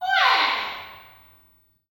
BIRDCALL, Heart of Africa; Spectrasonics; BIRDMEN

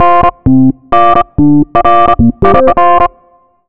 Synths, Underground UK House & Electro

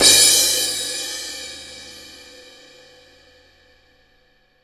CRASH18 FF, Drums; Big Fish Audio; Ross Garfield Drums VOL-2; ST LOUIS KIT

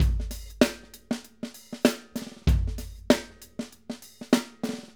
Acoustic Live Drum

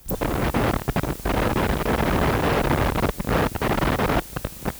Tape Noise

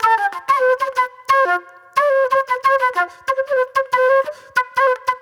Soul-Jazz Flute, Call And Response

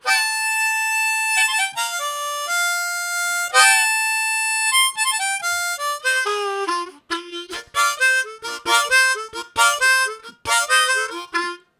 High Trill, Harmonica; Zero G

